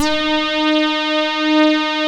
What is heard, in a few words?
SYNTH LAYER; InVision Interactive; Keith Emerson; The Most Dangerous Synth and Organ